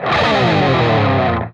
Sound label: PRS SLIDE, guitar